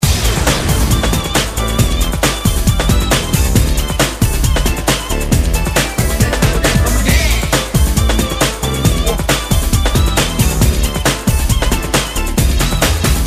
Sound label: Techno Remix